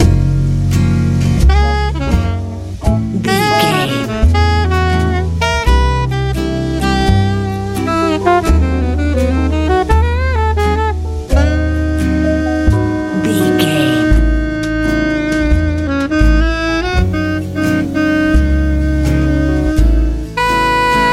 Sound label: fun good swirl big life easy smooth jazz